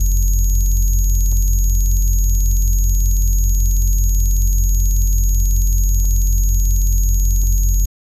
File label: radical hardcore